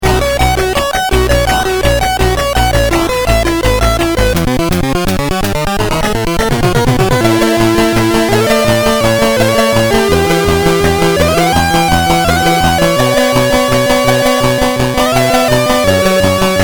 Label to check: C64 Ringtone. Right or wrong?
Right